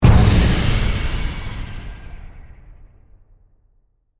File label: Hit, Deep, Swirls, Reverb; Production Element, Imaging Element, Accent, Transition; BlastWave